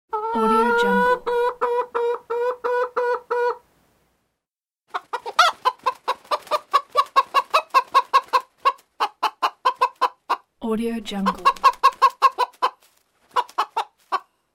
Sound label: chicken